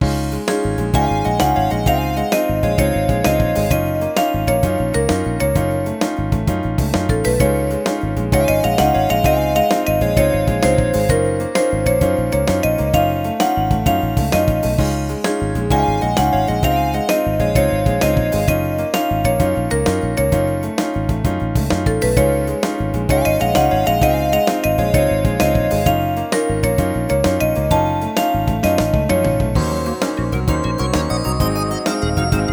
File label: happy conversation; mahou senshi symphonic knights megami wo tsugu otometachi